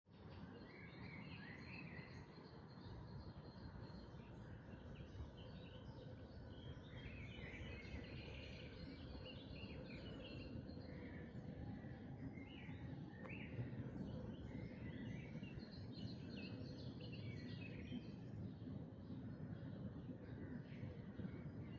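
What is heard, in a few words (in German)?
Dawn Chorus, Vogelgesänge vor Sonnenaufgang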